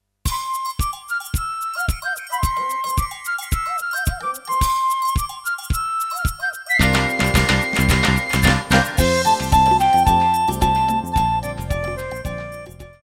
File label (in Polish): wersja instrumentalna